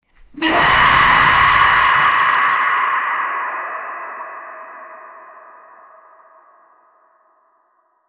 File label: death clench